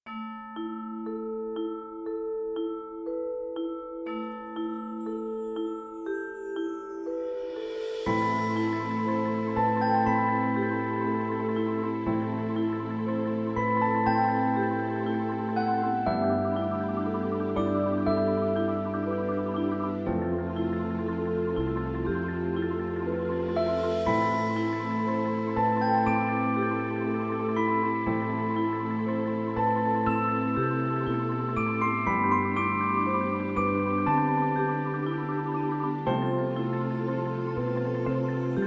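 Symphony For Percussion, Marching Band Indoor Percussion and Winds; Part Two; Mockup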